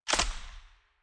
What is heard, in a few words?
shout